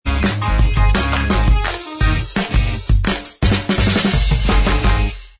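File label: theme instrumental track, backing tracks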